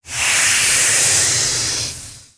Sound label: Pets Tortoise Angry; creatures pets; Lego